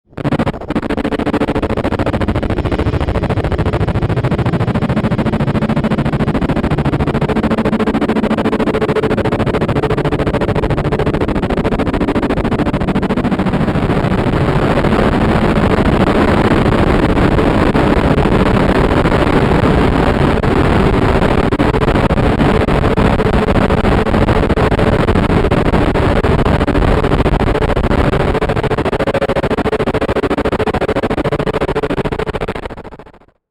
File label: Bed Static Glitch Hiss Fuzz; 5.1 STEREO MIX; Blastwave